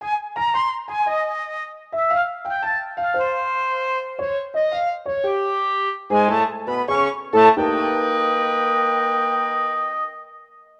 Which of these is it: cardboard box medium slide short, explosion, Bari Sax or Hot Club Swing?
Bari Sax